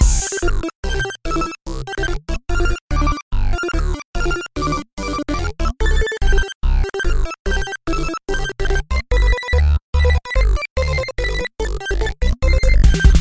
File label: danger funny; Identity V